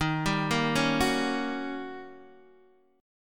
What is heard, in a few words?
Eb 7th Sharp 9th Eb, Guitar Open D; down